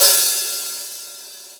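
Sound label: hi-hat brig open, EMU Orbit-3 drum machine; 300 Drum Machines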